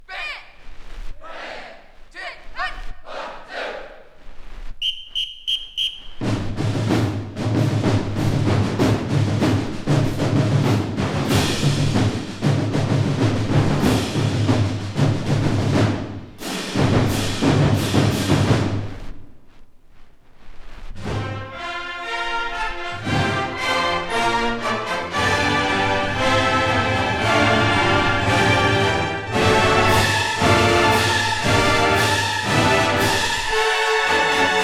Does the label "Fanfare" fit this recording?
Yes